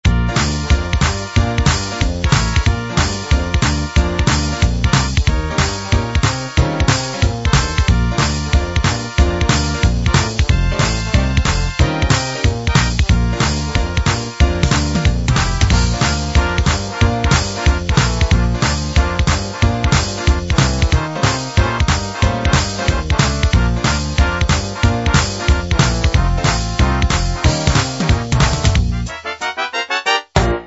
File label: boogie